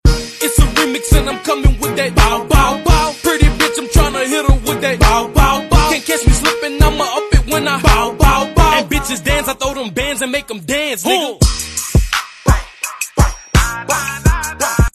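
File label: ringtone remix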